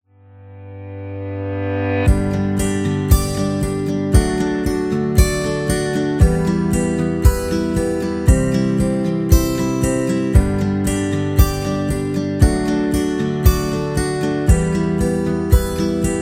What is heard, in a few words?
Happy Wedding